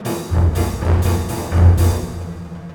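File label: fairground 116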